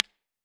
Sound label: AC30 normal sm57 closer; IRs